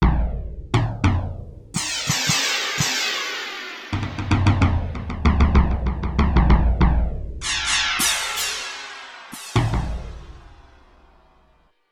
Delay-Down, Cymbals & Bass Drum, Yamaha TX16W

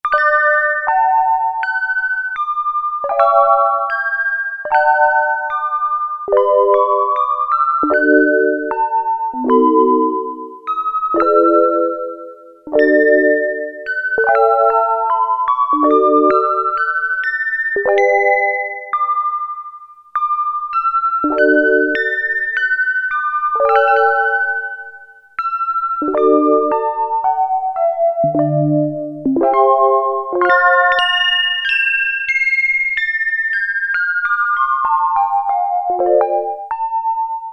Toy Piano; Sequential Circuits Prophet-5 (Ver.3); Factory Patch

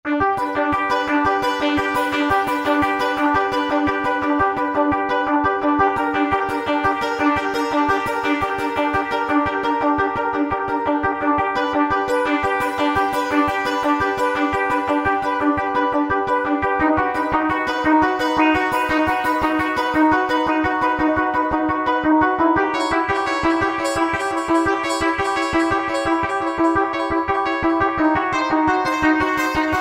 Roland V-Synth Arp; Factory Patches; LabyrinthArp

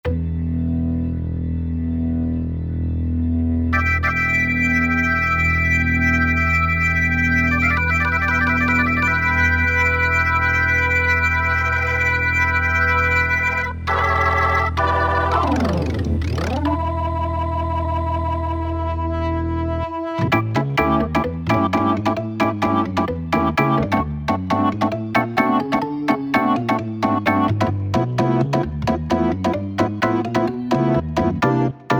E-mu Proteus VX Rock'in B

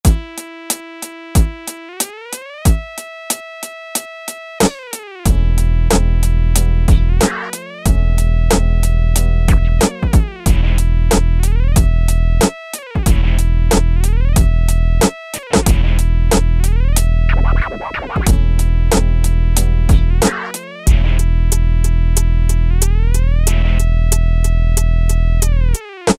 HiRolla, Yamaha DJX Styles

